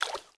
splash, footsteps

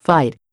fight; shortbow

